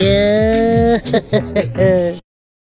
Laugh; Raja Rani

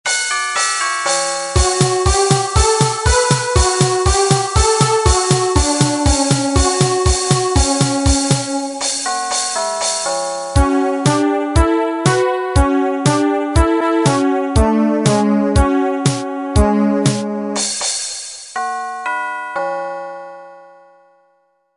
cello

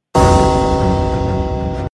new bell sms

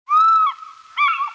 african fish eagle

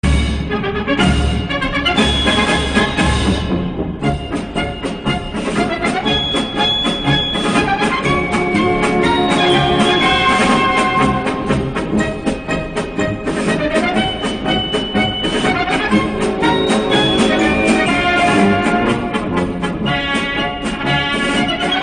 marsch; classical

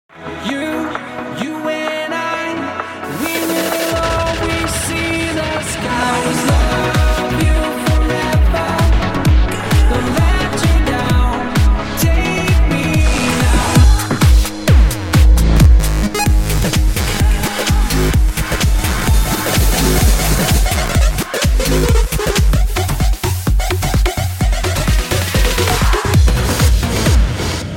Reggae

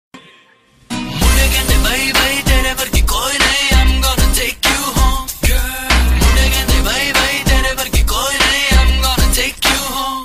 rap, hip hop ringtone

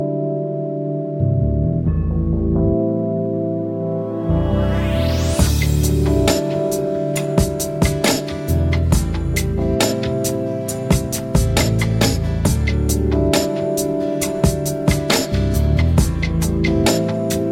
extatic sounds

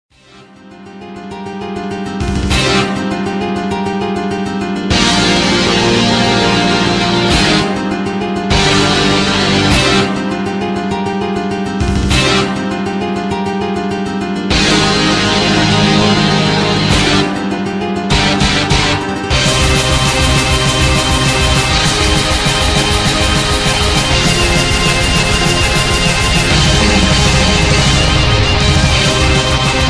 midi

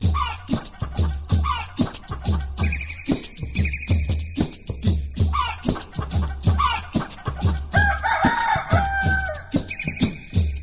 Alarm Beat

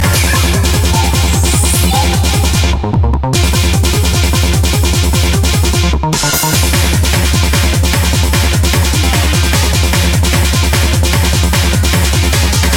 Pop

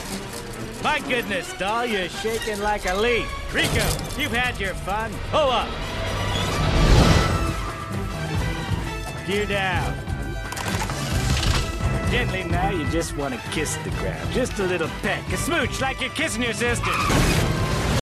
Plane Crash; Penguins

